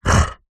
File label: animals bear grunt